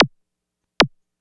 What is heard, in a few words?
Moog Snare; Sonic Foundry (Sony Creative Software); Electronic Point Blank